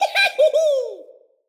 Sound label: Trapped Sprout Falling Vocal; Friendly; Sproutfolk; Fey Hub Sprout